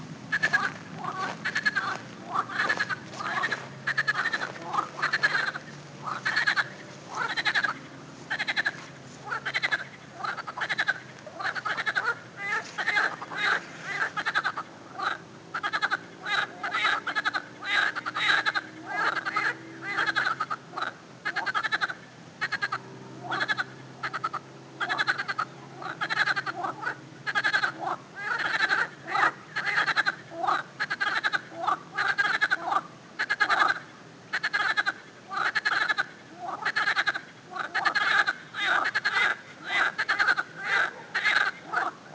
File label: frogs